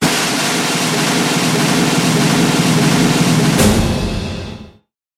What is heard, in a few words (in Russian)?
effekt barabannoj drobi